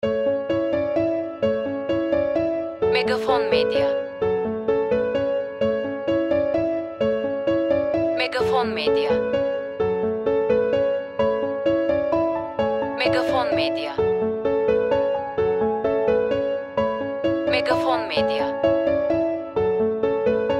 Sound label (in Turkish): SANTRAL MÜZİK